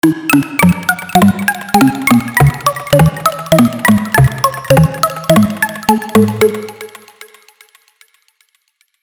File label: nokia tune